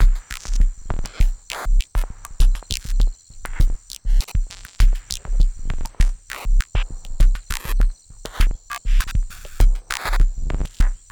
Background Loop

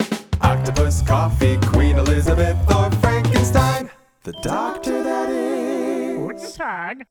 Bumper; YDKJ; doctor; The Jackbox Party Pack 5; Jackbox Games

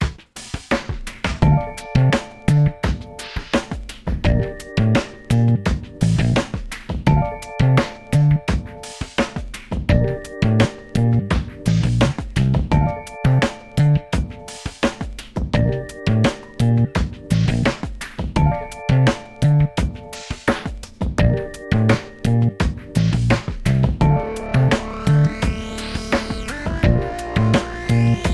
Write Loop; The Jackbox Party Pack 5; Jackbox Games

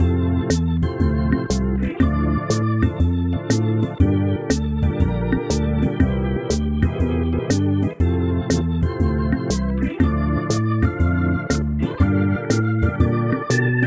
Theme (organ); YDKJ; The Jackbox Party Pack 5; Jackbox Games

